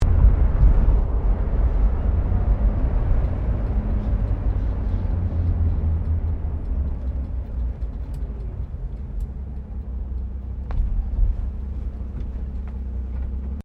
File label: CAR HOLDEN FE INTERIOR DECELARATING, Classic Australian cars; Car Holden Fe 1957; Cars (Sound Effects:Vehicles)